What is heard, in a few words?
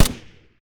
g37 silencer; STALZONE